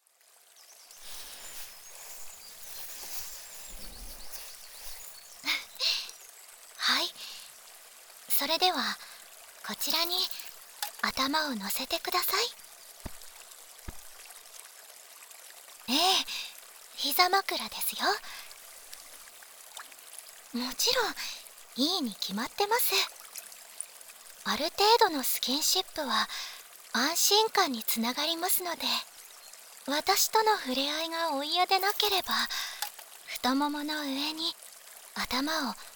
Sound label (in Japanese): プラスチック耳かき; きっとここへ導かれたのですね